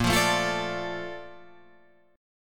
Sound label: Bb Major 7th, Guitar, Drop D